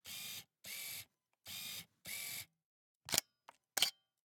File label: Camera, Pentax KS2, DSLR, electronic, mechanical, focus, out in, adjust, snapshot, XY; Eiravaein Works; Vaeyan IV